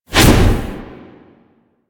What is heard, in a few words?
Hammer Whoosh; Warframe; Veilbreaker; Sprag